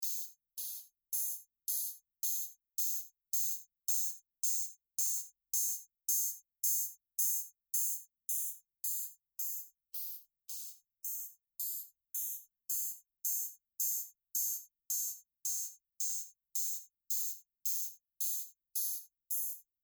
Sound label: HiHat